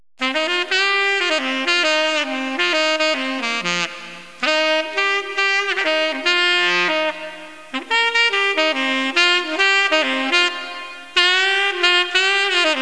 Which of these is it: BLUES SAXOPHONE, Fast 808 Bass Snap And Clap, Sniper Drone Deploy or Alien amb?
BLUES SAXOPHONE